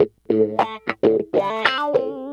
guitar, Loops, Phonky

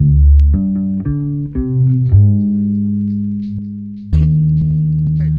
bass

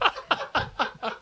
laugh group of men; ooh ahh

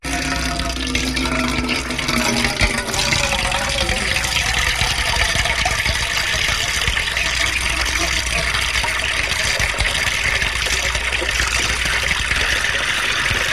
water pouring

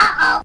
uh oh, cartoon SCRATCH; ooh ahh; uh um

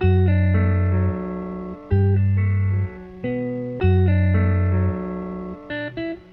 guitar, Jazzmatazz loops

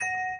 music box; Bells

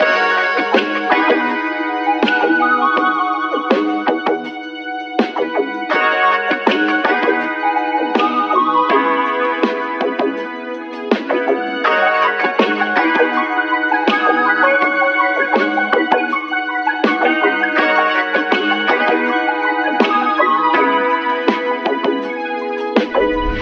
keys funky, Phonky loops

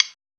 Snares, Rimshot

